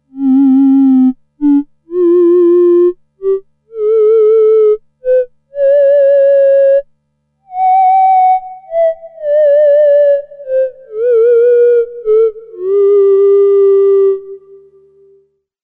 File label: theremin